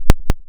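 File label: ring modulation saw sin, env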